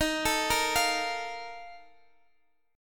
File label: Bb Major 7th Suspended 4th, Eb, chord